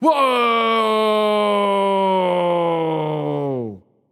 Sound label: battlecry; smite; raijin